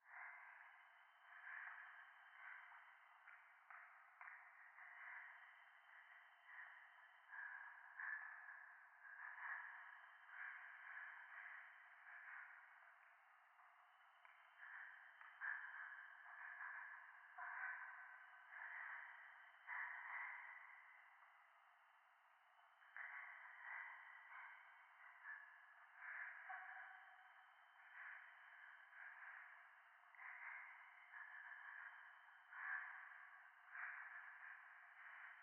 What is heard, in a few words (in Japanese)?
廃墟 右耳快感吐息 山奥